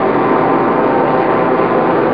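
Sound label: drone loop; flying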